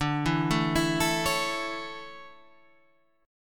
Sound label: D Major 7th Suspended 2nd down; arpeggiated; JGuitar